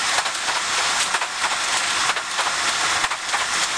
Run, Train; OpenBVE

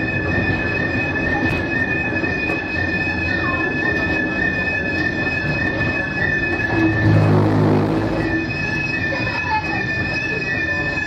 Rub, Train; OpenBVE

